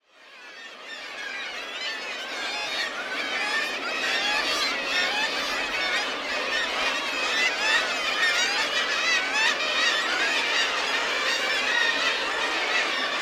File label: Black legged kittiwake; پرنده کاکایی پاسیاه